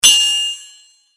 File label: Sword Sound; FXHome; Fighting Sounds